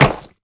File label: staff, steps; The Monster Plane; necrolyte